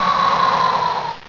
Frost Breath; PokeRogue; battle anims

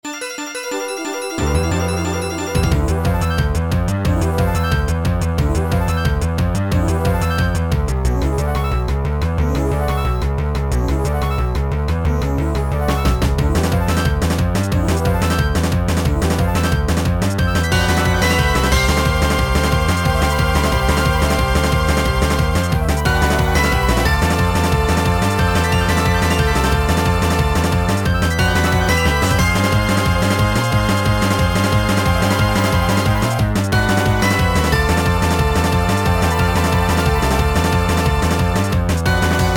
battle rival; PokeRogue

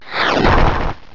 Petal Blizzard; battle anims; PokeRogue

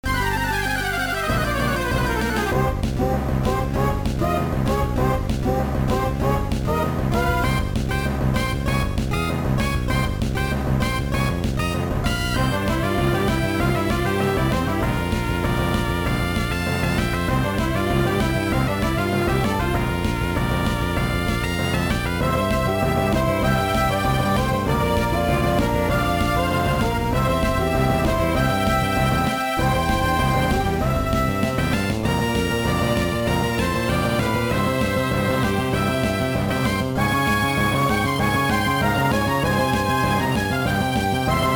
battle, bgm; PokeRogue

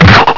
Low Sweep; PokeRogue; battle anims

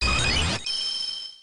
Lucky Chant; pokerogue

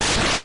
Slash, battle anims; pokerogue

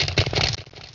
Frost Breath; battle anims; PokeRogue

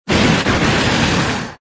rapidstrike, cry; pokerogue

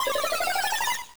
upgrade; pokerogue

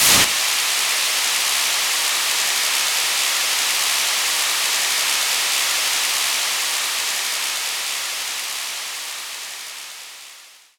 drift; Mario-Kart-3.js

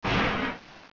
Vacuum Wave; battle anims; PokeRogue

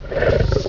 Snarl; battle anims; PokeRogue

